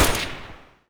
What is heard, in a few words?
polycraft end